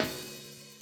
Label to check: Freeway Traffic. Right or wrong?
Wrong